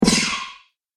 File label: Scifi Weapon Shot, laser gun